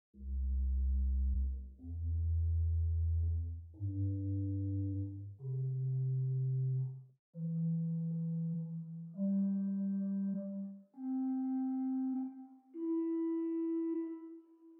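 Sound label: Pedal Flute Bass 8 arpeggio, organ; St Annes Moseley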